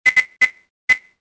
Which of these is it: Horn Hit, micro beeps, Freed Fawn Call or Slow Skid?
micro beeps